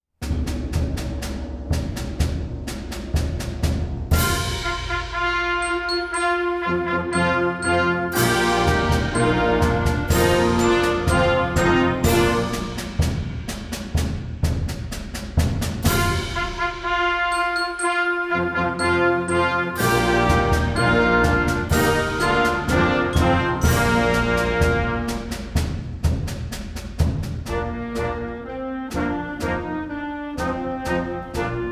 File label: premier fanfare, Concert Band